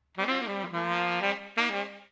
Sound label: OLDSCHOOL BRASS 96